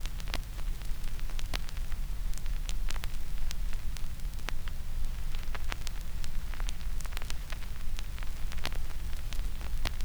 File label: Noise Loop breaks